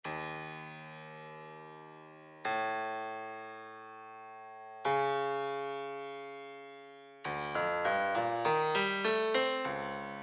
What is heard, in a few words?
grand piano 70s